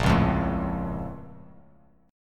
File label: A sharp Minor Major 7th, Bass, chord; A#mM7 strummed; Bass Standard; JGuitar